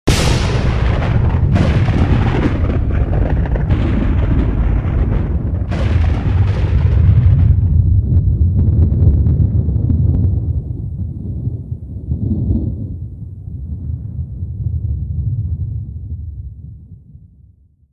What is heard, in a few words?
thunder